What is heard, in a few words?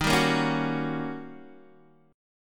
D sharp 7th Sharp 9th Guitar DADGAD